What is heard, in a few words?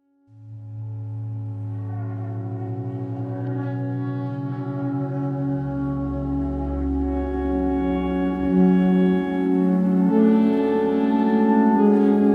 Instrumental